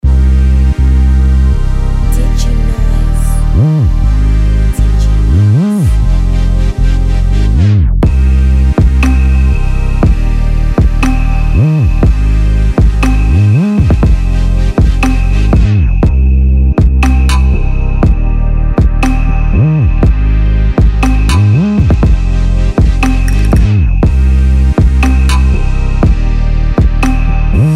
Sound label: Bass; More About That Bass 2